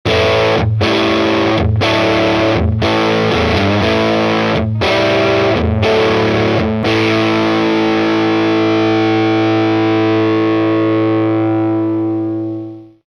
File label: Solo Marshallish Hot, Guitar Effects Pedals; Pro Co